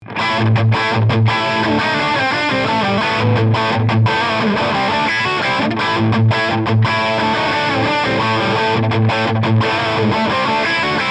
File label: Drive Stereo New Tubes, Bugera 333